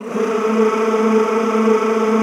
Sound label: A2-L, Extended Classical Choir; Best Service